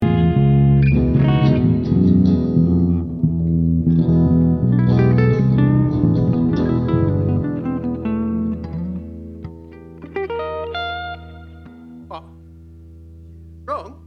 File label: Strings; no groove